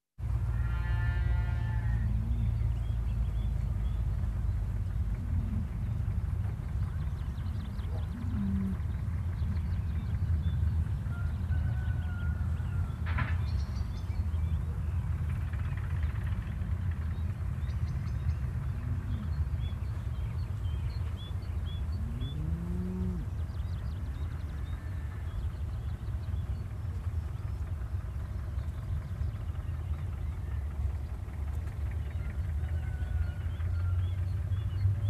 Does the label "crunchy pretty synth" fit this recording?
No